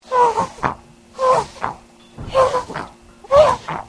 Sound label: Ice Bag, Sound of sand; 氷のうで鳴らした音; これは湿式の鳴り砂であり; 乾式でやってみたが鳴りにくかったので湿式にした; これはオクターブ倍音のみが大きく発生している